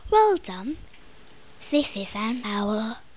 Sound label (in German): uhu; Little Red Riding Hood; Koch; Storybook